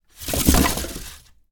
Styrofoam, Pile of Styrofoam, Handling, Drop, Long Tail; Double Trouble Audio; Junk and Debris